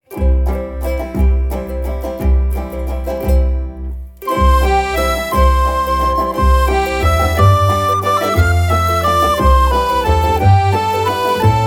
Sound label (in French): valse